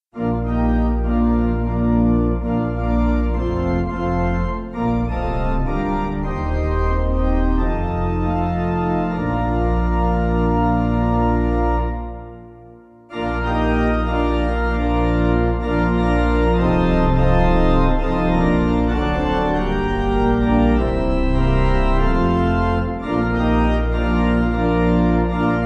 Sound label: Pipe; The God And Father; Paradwys